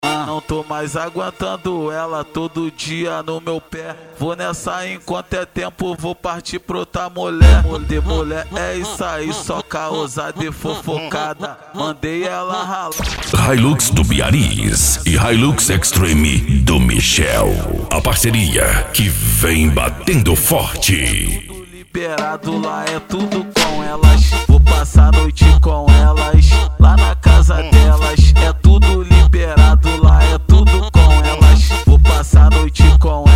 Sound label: funk mega bass